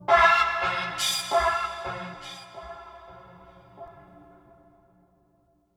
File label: String Mystery; Inquisitor 3